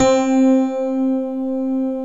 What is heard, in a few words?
DIG ACU PIANO; OMI - Universe of Sounds